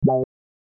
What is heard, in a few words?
sound ring; Series 40; Nokia; 6270 WAP